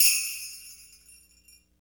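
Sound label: sleigh bells; Roland L-CD701; PRC Latin 4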